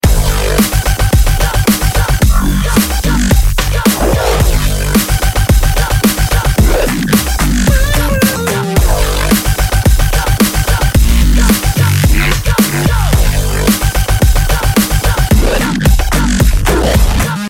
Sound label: dubstep; ringtone